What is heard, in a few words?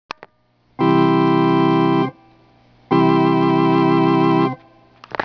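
vibrato, organ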